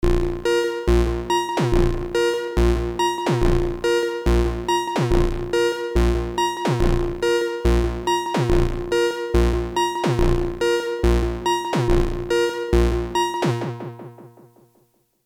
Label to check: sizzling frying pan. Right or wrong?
Wrong